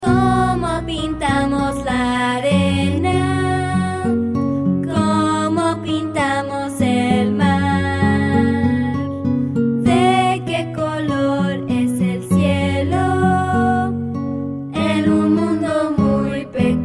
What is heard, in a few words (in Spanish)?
un mundo muy peculiar